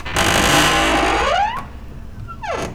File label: short wooden door creak